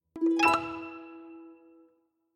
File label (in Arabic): إنتقالة تنبيهية